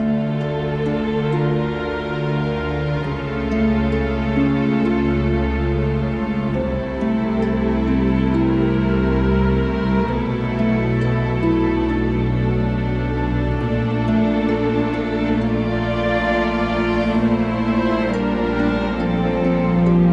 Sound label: strings; Sad